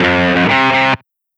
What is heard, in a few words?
Guitar Lick; Sonic Foundry; Rondo Brothers Trip-Hop Loops & Samples